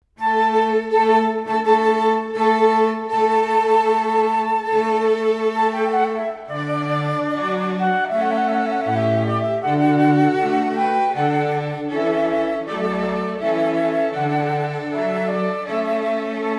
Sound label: Trumpet Voluntary, Flute Quartet